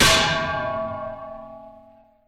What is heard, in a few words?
uppercut, Bells; Misc Percussion